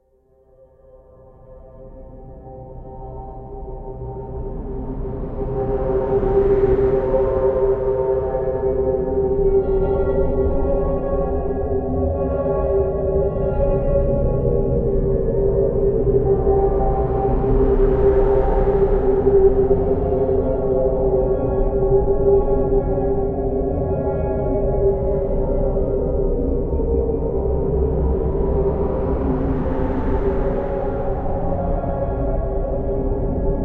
Drone, Ambience

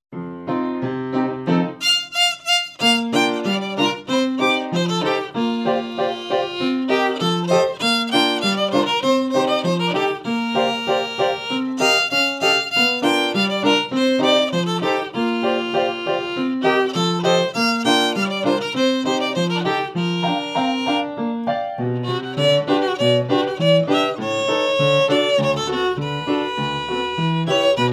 Piano Violin Duet

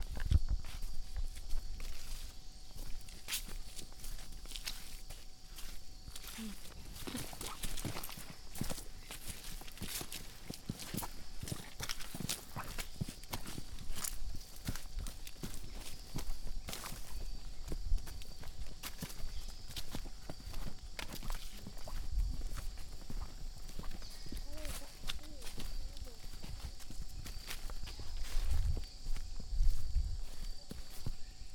mud squelch, Jungle; Tamen Negara Malaysia

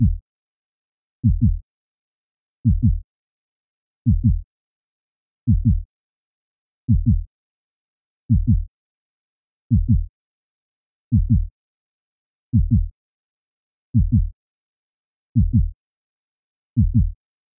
Heartbeat

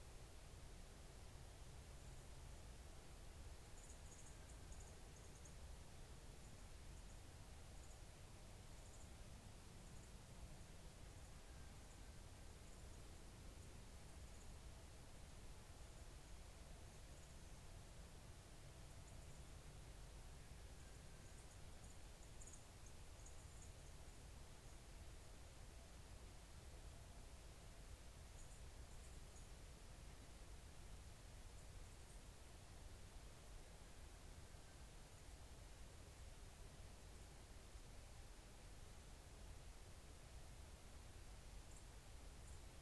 bioacoustic observation

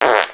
FART

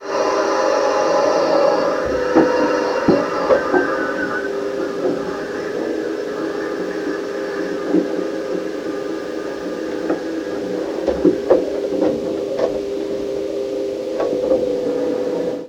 Machine Room accumulator Hissing, Eiffel Tower

